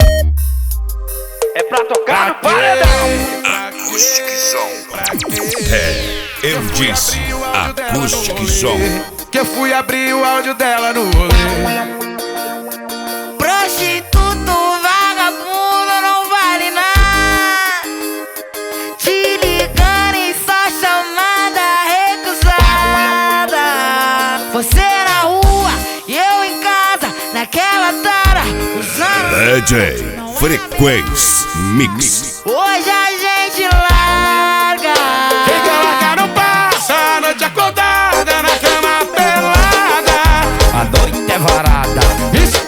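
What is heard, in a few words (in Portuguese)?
mega funk mix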